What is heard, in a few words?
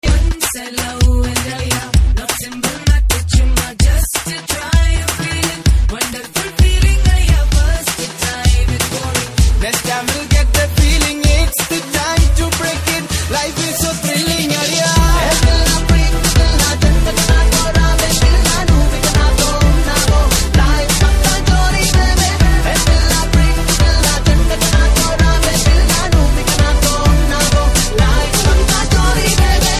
Ringtone; Lovers Day 2019 Movie; Telugu Ringtones